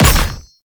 Projectile Impact A; Ultimate Abilities; Madurai; Warframe; Focus

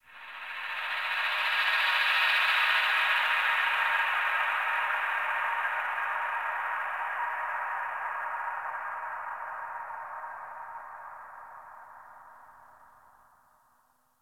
Space One Off, Ambience Exterior; Warframe; Tenno; Sector Wars

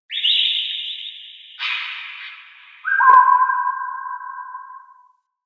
Swamp Tropical Bird, Ambience; Midrath Island

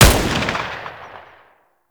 Uzi Fire; Warframe; Tenno; Prime Tenno Uzi; Weapons

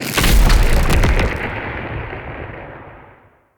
Lightning Blast Mark; Characters; Enemy; Abilities; Nimrod

